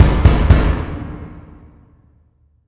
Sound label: Knock